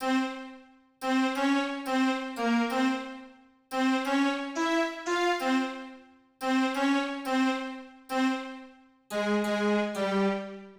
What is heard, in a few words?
Strings A, 089 Cmin